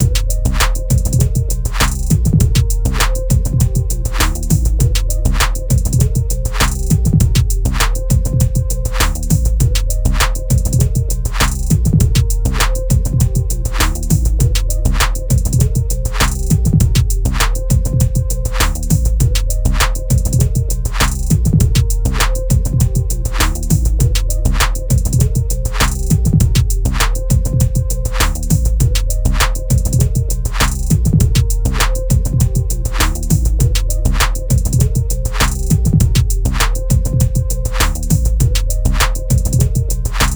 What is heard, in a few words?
Random Beat